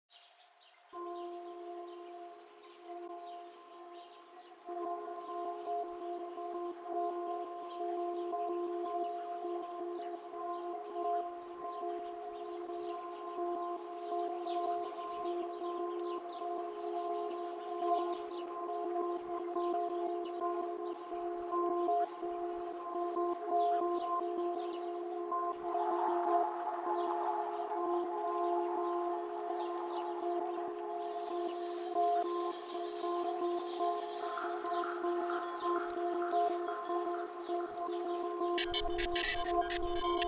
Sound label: sheep shearing machine